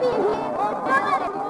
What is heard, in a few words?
walla; DAoC